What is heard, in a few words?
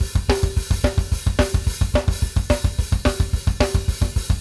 DBL UP OPE R, Drumworks